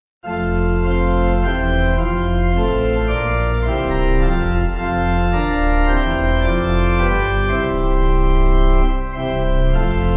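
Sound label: Pipe Organ, Music Backing Files for Churches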